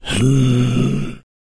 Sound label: Zombie Moan